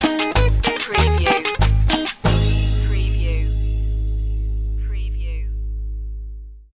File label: colombian party stinger